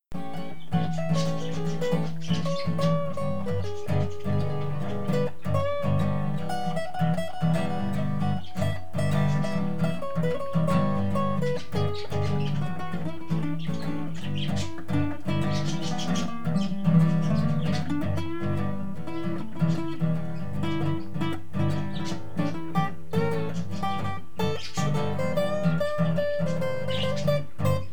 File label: The 5 String Blues